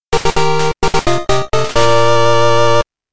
Fanfare!; Pokemon OST Collection; Pokemon Gold Silver Crystal OST

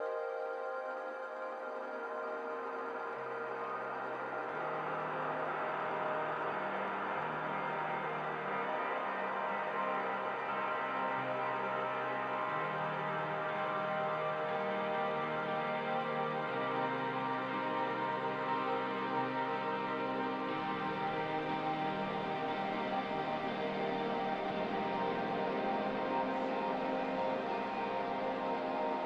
Warm Up, Workout Soundscape

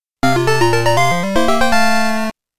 Fanfare! Get Egg; Pokemon OST Collection; Pokemon Gold Silver Crystal OST

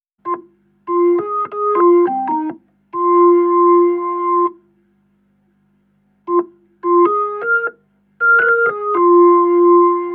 (Organ version); Kids on the Slope Original Soundtrack Plus

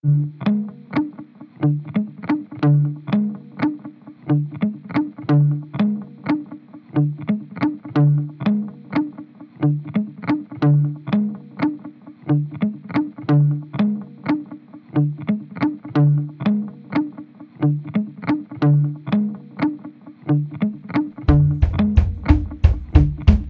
instrumental

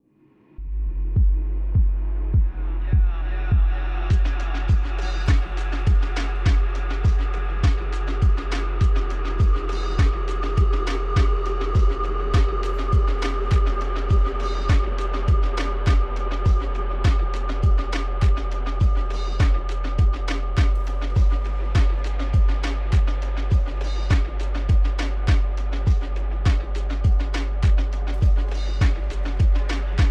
Light Cardio Workout Soundscape

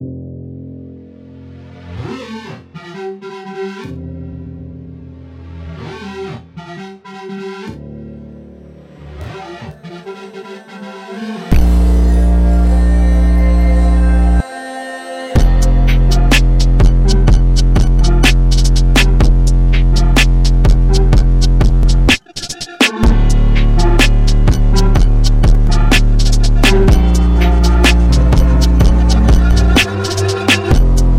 Instrumental

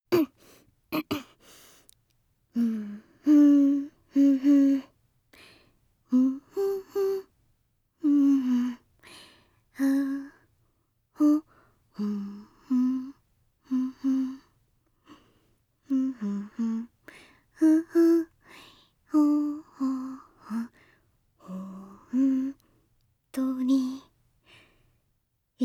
Humming; NieR Music Collection; NieR RepliCant Drama CD

